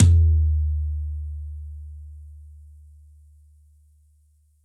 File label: Tom Low